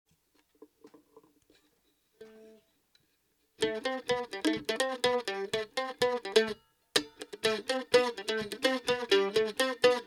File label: mandolin comparison acoustic noodles